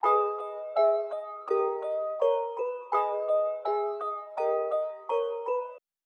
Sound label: 166 BPM G# Min Plucks; Melodies; Loop Stems; Cymatics; Goosebumps; Pharaoh Premium Drum Samples (BETA)